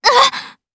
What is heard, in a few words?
Damage, jp; DarkFrey; kingsraid